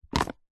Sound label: foley plastic lunch food pot remove from inside another; zapsplat